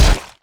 melee mega creep impact; Radiant

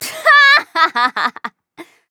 Laugh; Alchemist; Paladins

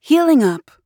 Using Healing Potion; Realm Royale; Mage; Kunoichi

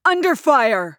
Mage Taking Damage; Realm Royale; Kunoichi

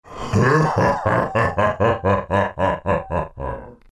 laugh, zh

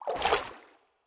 Turtle swim; minecraft

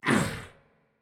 Assassin Grunt Jump; Realm Royale; Chained One

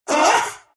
pain ru; Rubick; dota2

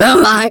pain

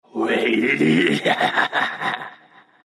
laugh zh; Dota 2

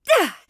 Hunter Grunt Physical; Twilight Huntress; Realm Royale

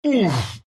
pain, ru; Dota 2; Tusk

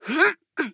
Grunt Jump; paladins; Rider

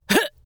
Death; Tyra

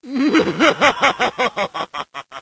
laugh; Throwback Chronos; Smite

